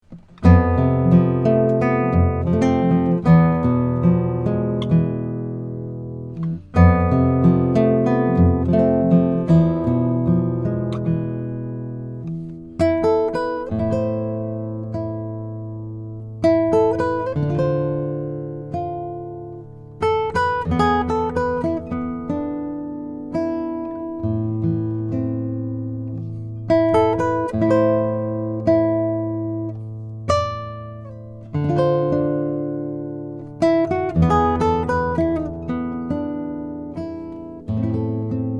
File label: lowden s25j indian rosewood